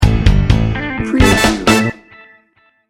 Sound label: rocker funk sting bumper